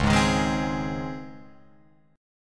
C sharp Major 7th Suspended 2nd, Guitar, Open F; C#M7sus2 strummed; 1,3,3,3,3,3; JGuitar

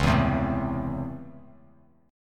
Db Minor Major 7th Sharp 5th, Bass Standard; DbmM7#5 strummed; JGuitar

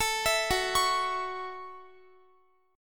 F sharp Minor 7th F sharp, Guitar; F#m7 arpeggiated; x,x,19,21,18,20

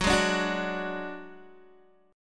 F sharp Suspended 2nd Sharp 5th, Guitar; strummed; JGuitar

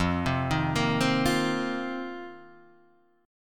F 7th Suspended 4th, down, Guitar, Standard; arpeggiated; JGuitar

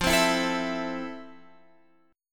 F Major 7th F, Guitar, Open F; strummed

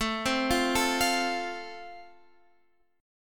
A Minor Sharp 5th, Guitar, Standard; Am#5 arpeggiated; x,12,10,10,10,13; JGuitar

